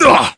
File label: jump; quake; dah sarge